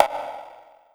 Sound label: bongo lo, Percussion; realdrum